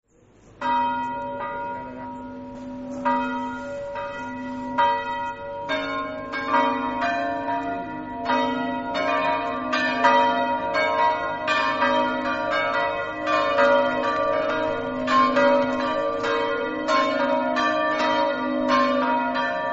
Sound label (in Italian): s martin, campane